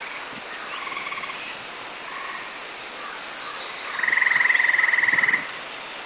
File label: bird